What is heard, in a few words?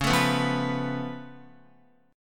Db Augmented 7th Db down Guitar; strummed; JGuitar